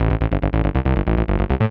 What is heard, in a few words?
SNTH BASS ELCTR 140 A, Techno